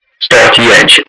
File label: start engine